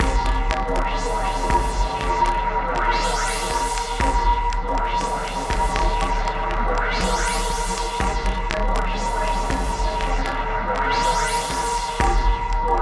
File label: Minimalism, Processed Percussion